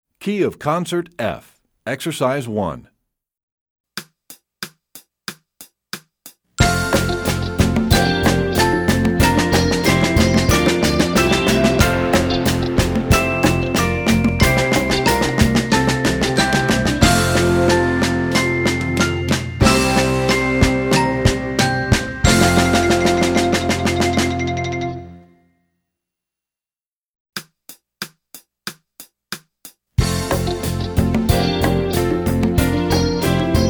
Concert F, Percussion; EE2000 Book1

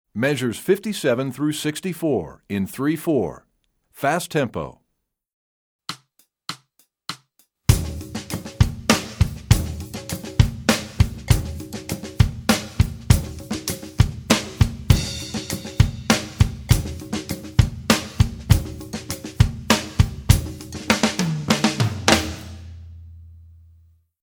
Fast Three Four; EE2000 Book1

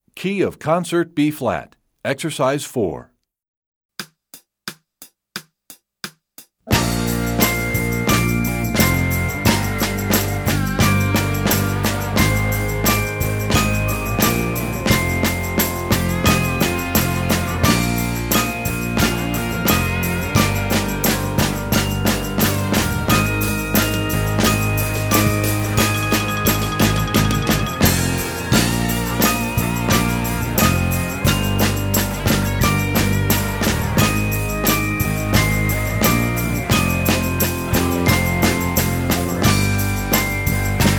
Concert Bflat, Percussion; EE2000 Book1

